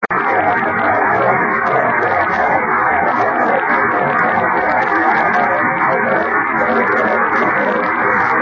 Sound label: 24G beacon; 横浜市; 茅ヶ崎市; ANT: 60cm dish; 受信：ＤＤ対応機; 曇、気温12℃ 湿度70％; SSB:RST405 レインスキャッタ